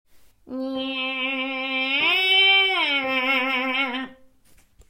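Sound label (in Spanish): Niña bending; Abuelita ardilla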